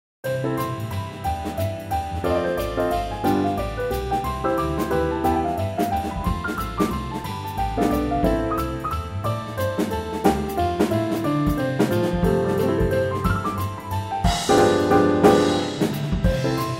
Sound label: Jazz Waltz